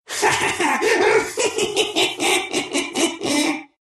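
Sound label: laugh, Russian; Rubick; dota2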